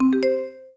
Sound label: down, Variations on a ringtone; tri-tone; Pitch shift (down 2 semitones)